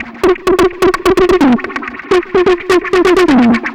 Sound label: Perc 128; musicradar